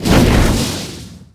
Fireball Lava Launch, Weapons

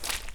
barefoot mud; Apotheorasis

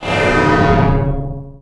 Pickup Scifi; SFX Kit

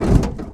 car rattle soft; Blind Drive

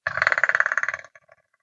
curious; Blind Survival

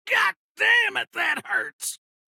hit reaction; abe; Blind Drive

